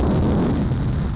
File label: player explode